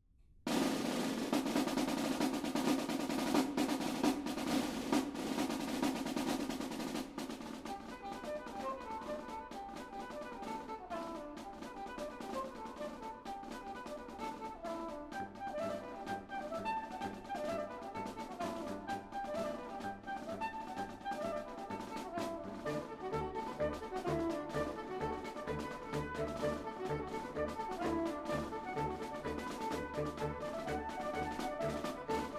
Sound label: Wind band